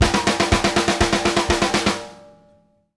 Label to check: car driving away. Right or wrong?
Wrong